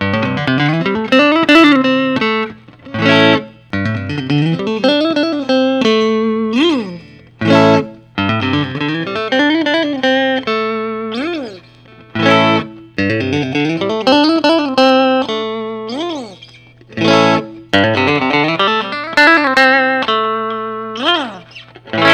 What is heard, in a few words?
fender custom shop 65 light relic